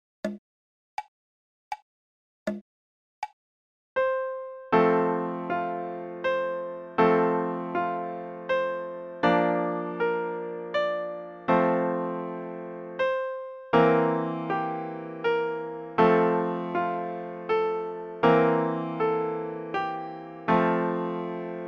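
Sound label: Good Morning In F Slow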